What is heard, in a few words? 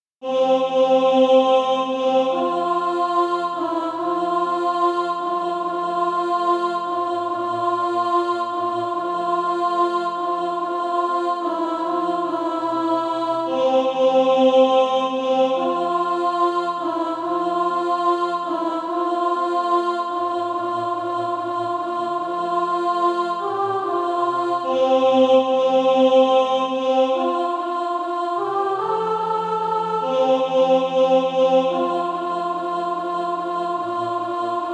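alto, Practise Music; From Choirs Redeemed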